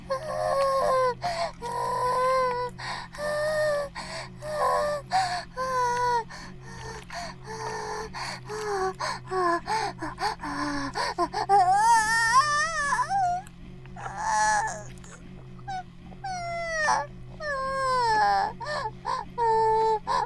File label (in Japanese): バイブ放置; イクたびにわけて